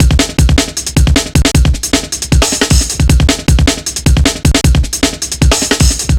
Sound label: 155, breaks